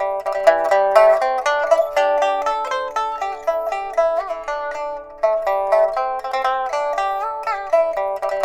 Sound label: PIPA, 90; INIS - Opium